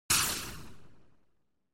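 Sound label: classic retro laser shot effect synthesized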